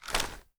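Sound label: gear rattle weapon large; viper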